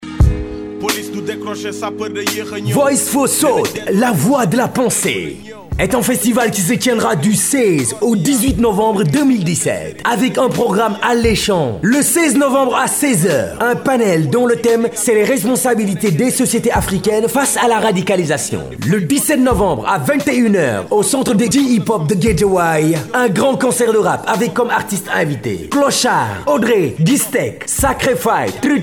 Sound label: HIP HOP; GUEDIAWAYE